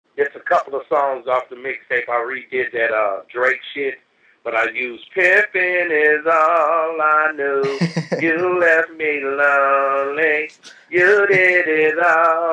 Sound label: acapella